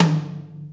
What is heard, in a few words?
high floor tom drum d sharp key